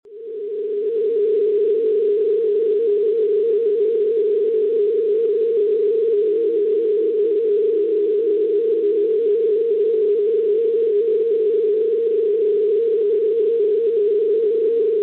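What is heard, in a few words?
non-directional beacon 299 kHz; BO; St Etienne; Francia; Schwarzsee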